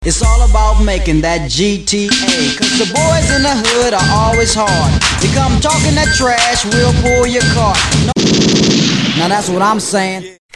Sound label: Hiphop Gun Mixture